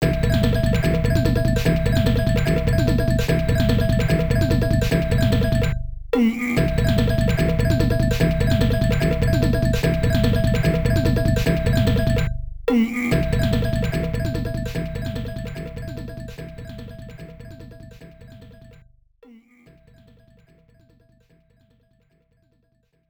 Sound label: Boss BGM; pulirula; f2 system arcade; Shaman Version